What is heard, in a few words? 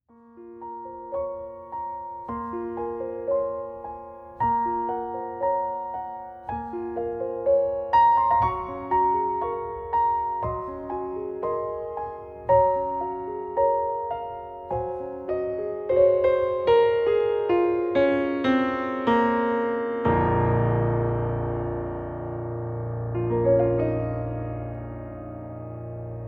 Naked Piano